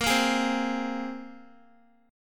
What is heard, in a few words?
A sharp 7th Suspended 2nd down Guitar 4F 4A 5C 5G 5C 6E; A#7sus2; strummed